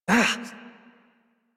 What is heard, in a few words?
Pain Short Operator Voice, en; Warframe; New War